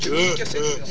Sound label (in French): rire